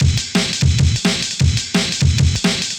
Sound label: Stomp, Loops, breaks; M8